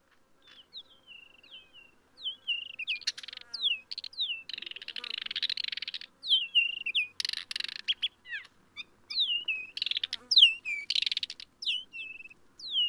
Ecstatic songs of three males in territorial dispute